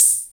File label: HH, Dance Mix